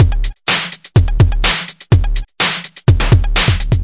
125 bpm flappy, drum loops and breaks

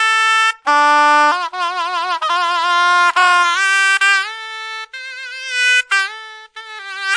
reedy instrument melody; WORLD

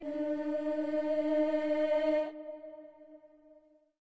Slavic Choir G 5th

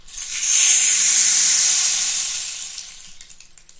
rainstick stick; ETHNIC and WORLD PERCUSSION

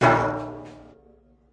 big cans, Big Rattle Cans

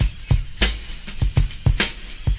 dark wheel, drum loops and breaks, 101 bpm; 101 to 110 bpm

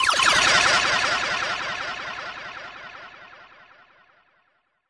zwoopy echo modular; Electro and Synthetic

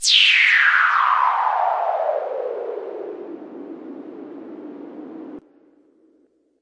long descending noise

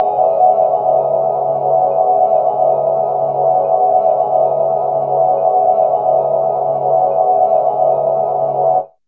bandpass ambience, Pads

